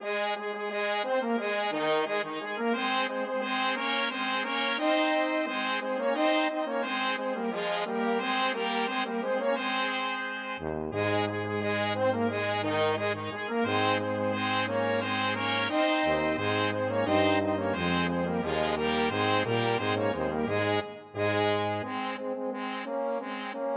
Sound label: Processional Fanfare